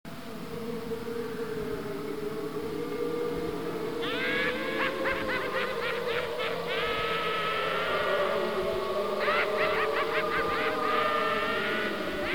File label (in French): Muzik hantée; bruitage